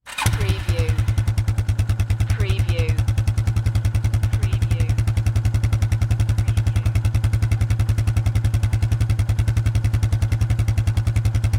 Quad Bike Start Up Idle Shut Off Exhaust Perspective